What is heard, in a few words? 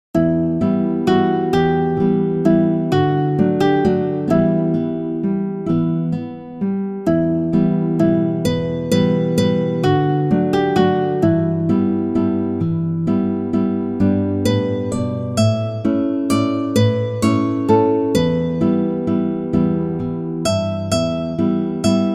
Scarborough Fair, guitar